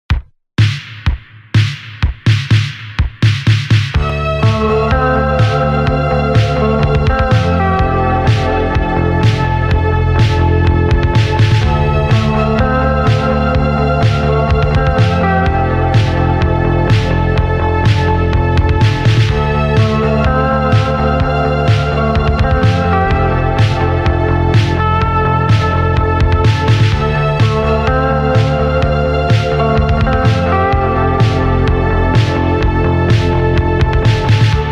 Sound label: slowed instrumental